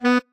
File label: marimba bat; ゆめ2っき